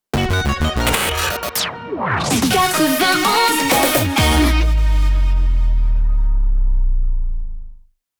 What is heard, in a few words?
Chanté F# 128 BPM Hyper ID (No VO); 91FM